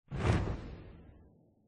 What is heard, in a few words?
flap, phantom; mob; Minecraft